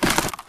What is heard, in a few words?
hack spectre armorrattle; dataknife; Titanfall 2